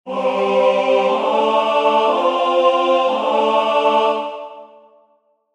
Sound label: organum